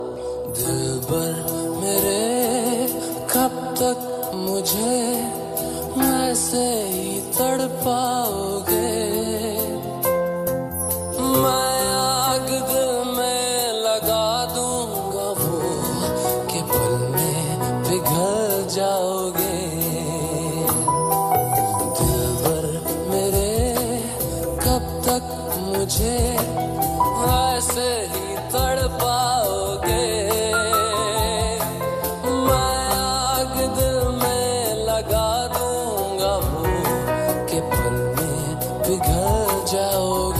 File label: Romantic Ringtone